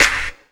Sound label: acoustic snare sound E key